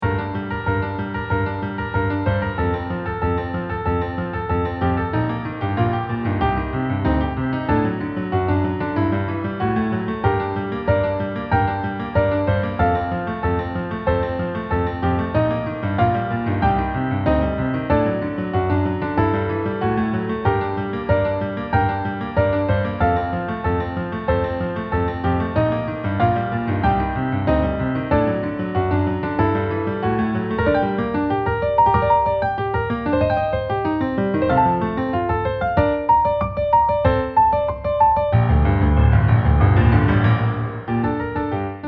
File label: piano